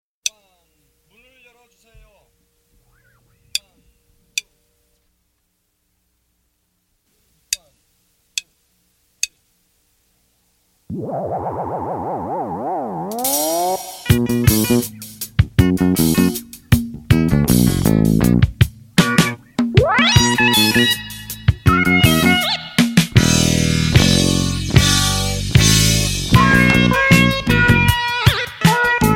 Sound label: korean soul